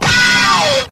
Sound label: beaow